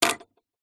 household cigarette lighter plastic set down on wooden table; zapsplat